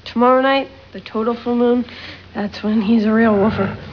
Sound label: woofer